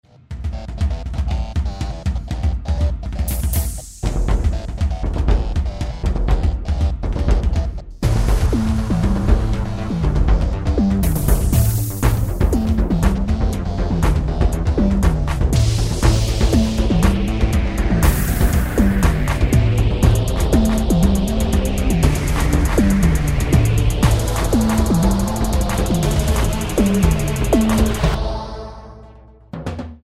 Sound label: bgm